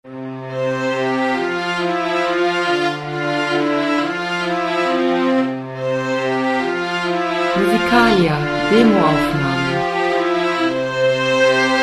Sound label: string trio